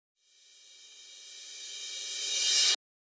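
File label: drum Loop 140BPM FX Reverse; Vengeance - Essential Clubsounds Vol.5; VEC5 Drumloops; VEC5 Multi Loops 140BPM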